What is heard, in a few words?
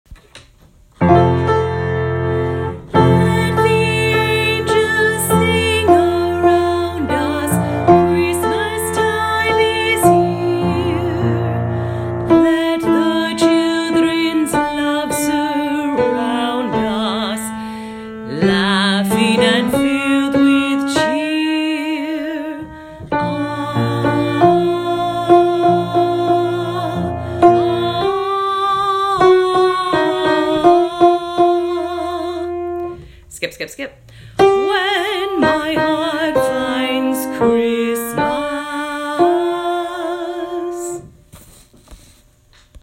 When my heart alto